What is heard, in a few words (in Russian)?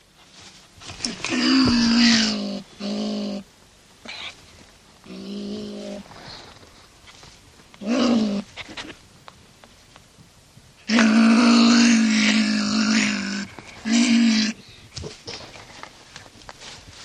kunica